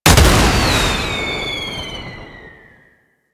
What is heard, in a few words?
Tank Missile Launcher; Weapons; Warframe; Lasrian